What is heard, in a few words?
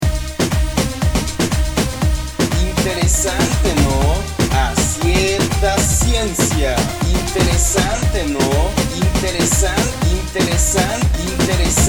Ringtone Dance Urban Mix